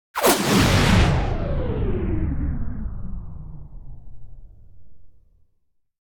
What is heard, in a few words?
Whoosh Sci-Fi Slow Down; Hollywood Edge; sound designer's toolkit v2